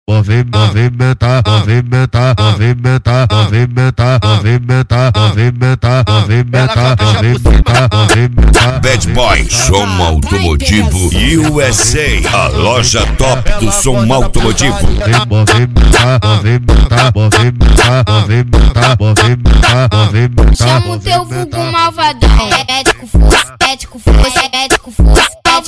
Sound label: especial funk; cd bad boy som automotivo usa